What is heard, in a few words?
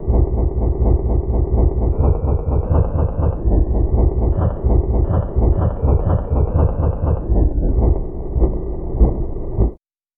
helicopter bass